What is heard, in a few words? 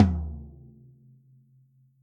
Studio Toms Hit